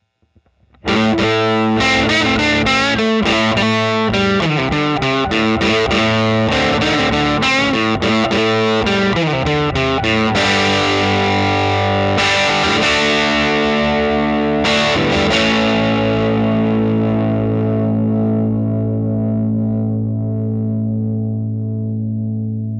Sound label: 6v6 dirty